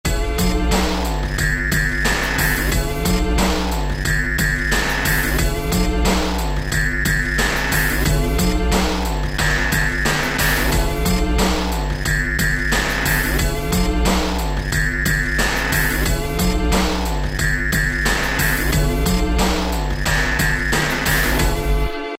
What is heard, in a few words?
Hum Ring; Korg ES-1; Factory Patterns